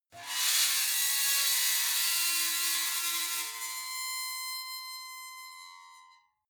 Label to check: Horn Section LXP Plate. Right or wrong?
Wrong